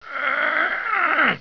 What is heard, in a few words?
plague Elf; monsters; HereticII Demo